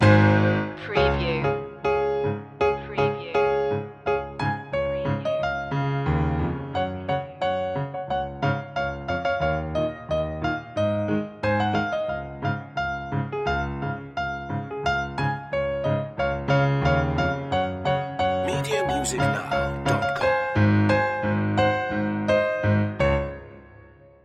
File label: piano loop; jingle bells; media music now